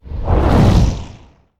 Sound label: Firestorm Whoosh; Wizard; Idle Champions of the Forgotten Realms